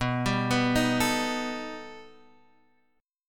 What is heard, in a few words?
B Augmented 7th B down Guitar Half Step Down